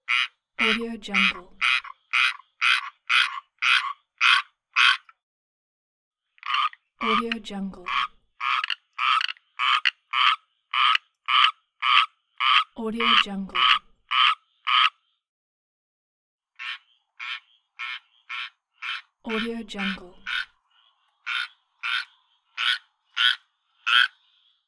florida treefrog pack; inspectorj

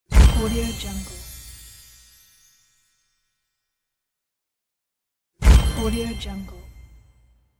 magical explosion

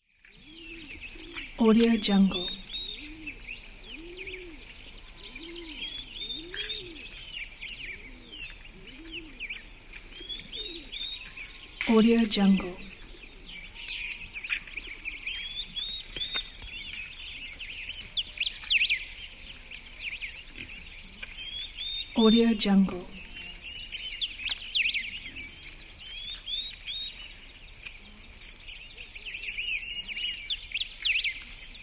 chirping birds

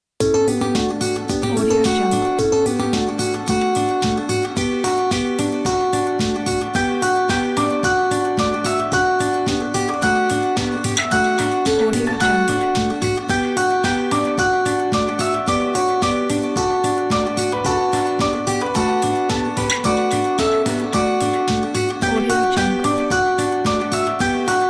gentle joy; silvermusic

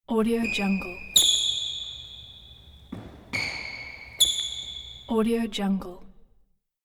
sneakers squeaks on racquetball court

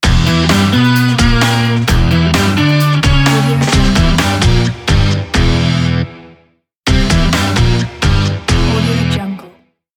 energetic rock modern logo

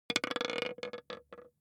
object table game dice small board; smartsoundfx

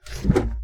lever flip

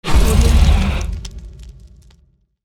fire woosh

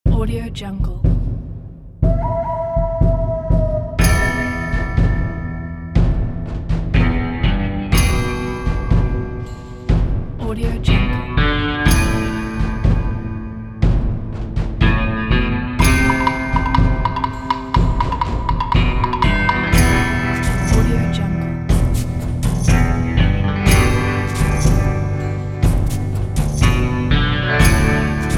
western

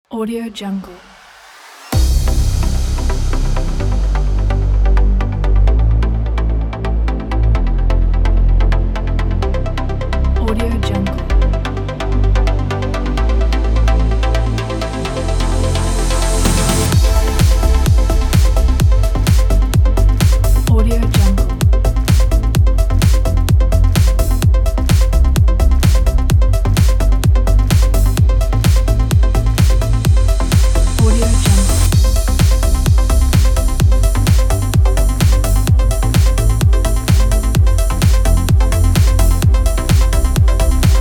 relaxing trance; alpinasound

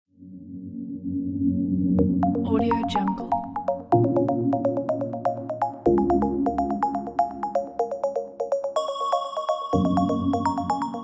smart technology; penguinmusic

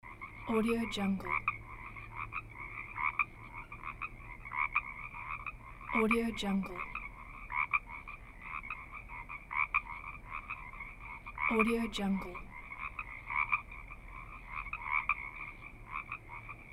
frog; lodosound

